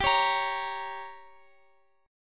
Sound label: G Diminished, Guitar, Open F; strummed; x,x,x,14,16,17